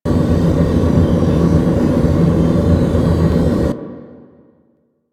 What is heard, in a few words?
Gas Pillar Machine Loop; Warframe; Corpus Gas City